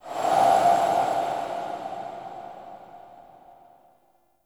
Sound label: Museum Pedestal Light Whoosh; Warframe; Vitruvian Museum